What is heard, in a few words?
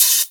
Open Hihat; Vengeance Samples; VEE2 Cymbals